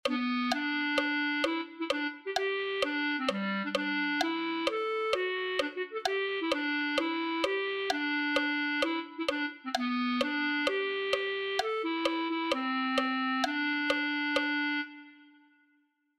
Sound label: clarinet